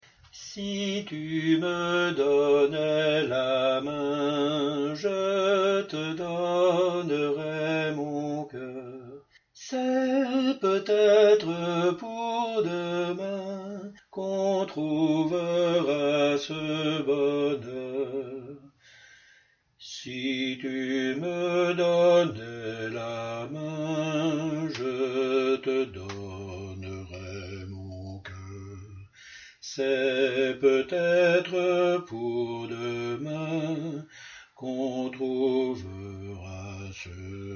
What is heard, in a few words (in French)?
basse